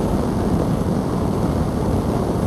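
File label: fire jet medium; Titanfall 2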